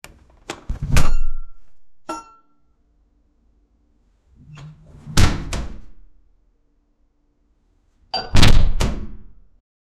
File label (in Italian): una porta che si apre; minecraft